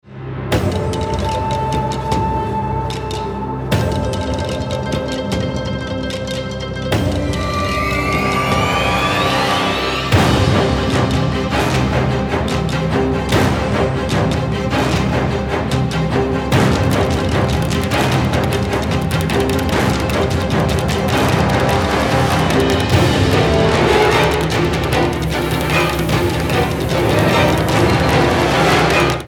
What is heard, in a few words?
Trailer Music